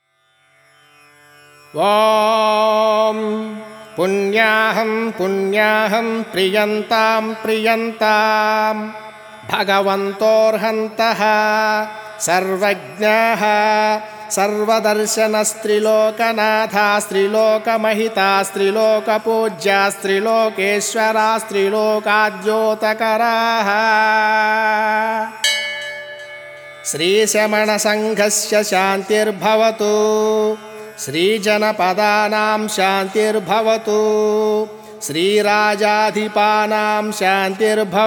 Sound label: shanti manthras